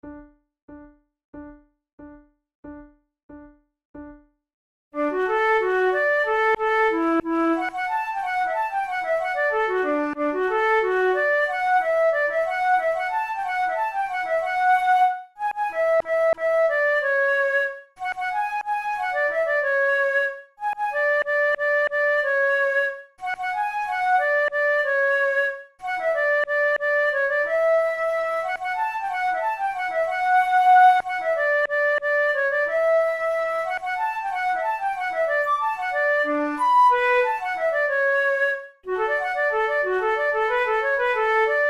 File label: duet, flute